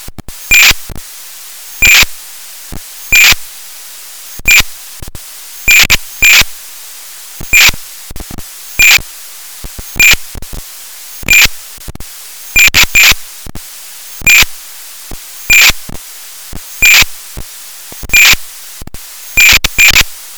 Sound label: M10 audio, Sondenpage